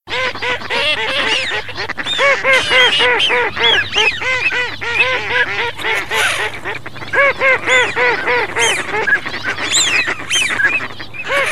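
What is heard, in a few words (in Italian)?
GERMANO ALZAVOLA CODONE FISCHIONE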